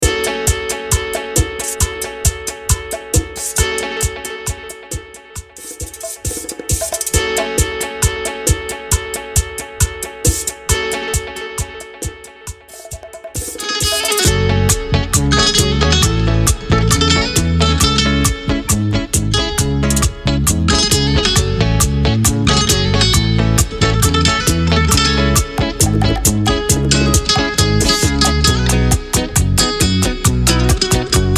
club rework 135 BPM

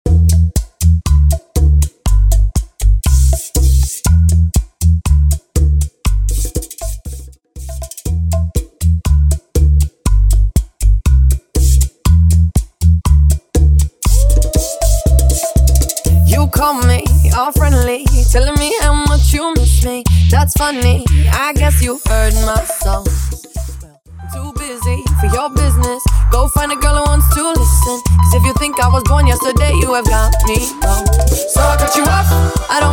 Bachata Project 120 BPM; bachata bootleg remix

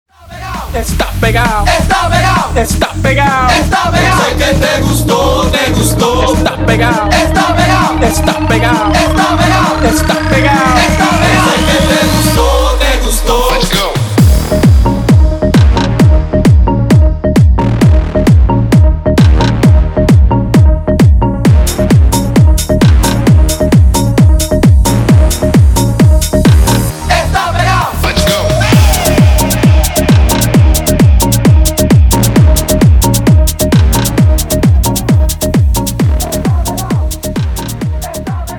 tribal remix 132 bpm

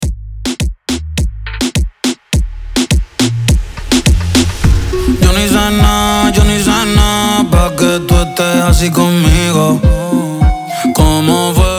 Intro Outro 104 Bpm

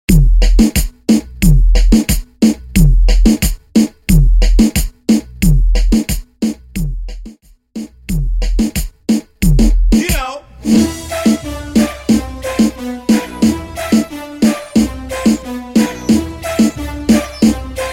Percapella Intro Outro 90BPM

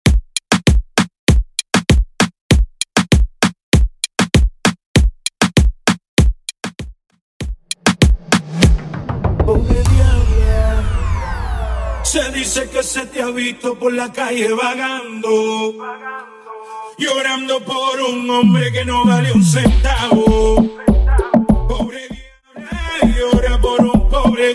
Percapella 98 BPM; Prodeejay Remix

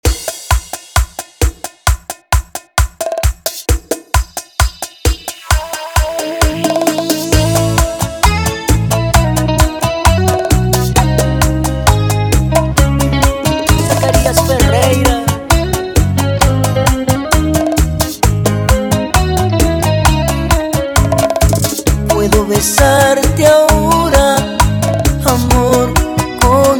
132bpm

